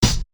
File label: Hihat